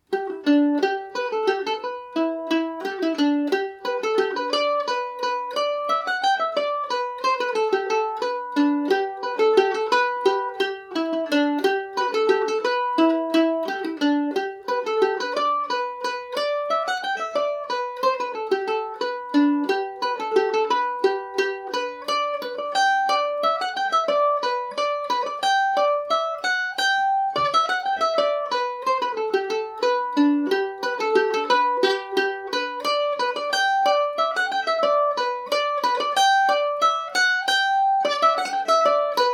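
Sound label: G major, irish mandolin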